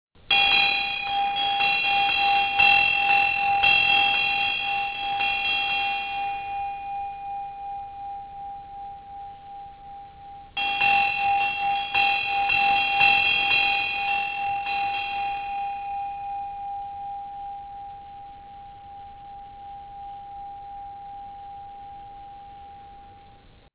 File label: Bells Large, tibetan bells; dorjes